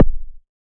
lowpop; Kicks; single hits

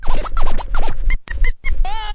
turntable, Scratching and Turntablism; DRUM LOOPS and BREAKS

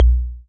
Pearl SC-40 Kicks

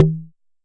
Jomox MBrane, drum machines; Jomox MBrane 11

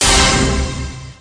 orchestra hit; Punches Hits Discoblasts; single hits

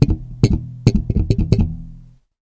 Bass FX, Bass Guitar Licks FX

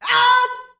voice "aww"; single hits

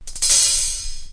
hat roll intro; FILLS and ROLLS

